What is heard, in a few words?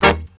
brs d#, brass; house; PS-28 Vocal House Samples